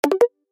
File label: Toner, ringtones